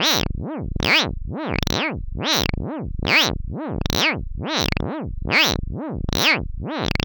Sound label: Noise, LFO & Noise